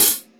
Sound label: Mega Drums, E-MU Pro-Cussion; drum machine